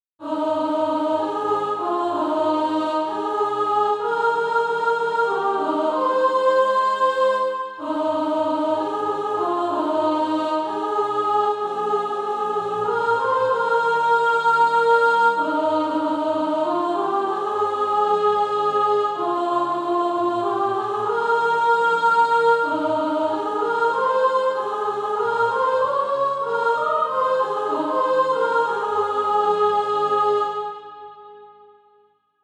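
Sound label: sop, Practise Music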